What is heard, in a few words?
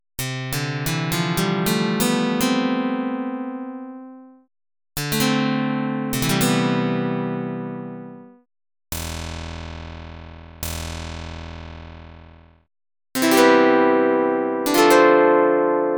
Noise